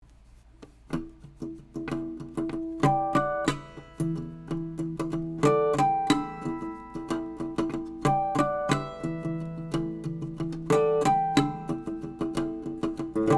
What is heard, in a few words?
Harmony Harmonic; 1930 Wards Airline flattop; Metric Halo ULN-8 converters; Cardioid Pattern; sE RN17; 17mm Capsule